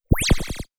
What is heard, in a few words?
Flash laser